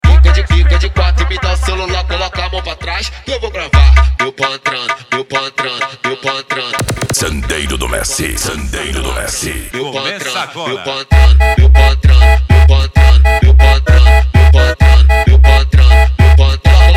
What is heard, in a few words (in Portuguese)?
funk mega bass